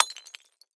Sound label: glass hit